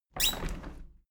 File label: window handle plastic squeak open regular; objekte; fenster